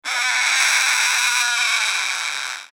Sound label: hawk squeal; sound ideas